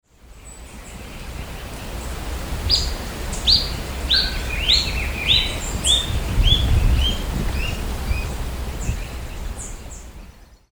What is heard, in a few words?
Sittasomus griseicapillus Aparaçu verde